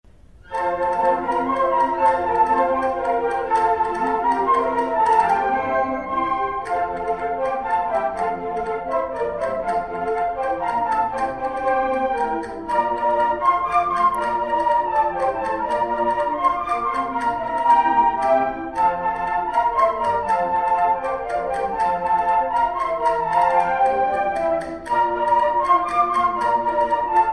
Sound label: Flute